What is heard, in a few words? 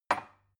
glass put down cupboard